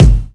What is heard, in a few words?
Kick